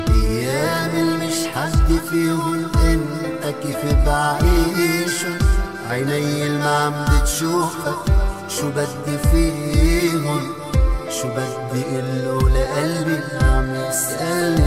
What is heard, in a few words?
arabic sad